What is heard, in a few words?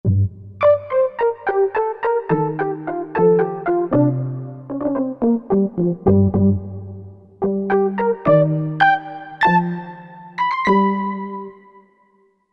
Horn Drops, Korg MSC-11S Brass